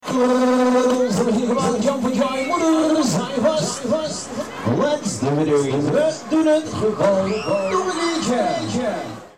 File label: jingle; Kermis Kassa Diversen